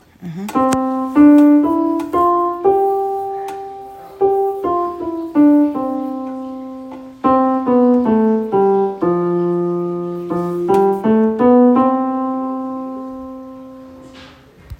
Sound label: Seagulls, Home Recorded